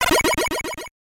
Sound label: Warp Sound; Megaman 2